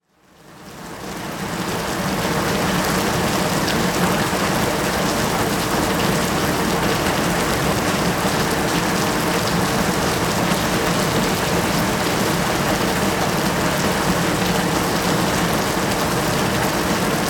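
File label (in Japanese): honburi no ame, heavy rain; Chino Cultural Complex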